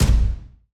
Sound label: dum